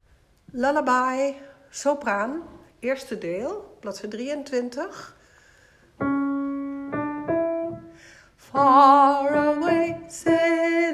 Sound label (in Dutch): Lullaby sopraan; Elgar